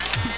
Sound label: switch; fludd; GAMECUBE; SUPER MARIO SUNSHINE